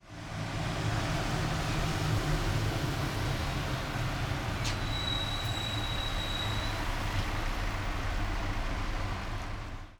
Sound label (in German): Portico 5504 4 MS to LR Strasse